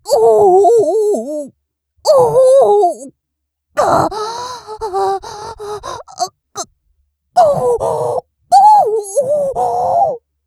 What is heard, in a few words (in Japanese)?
おぉぉぉ… おほぉ はぁっ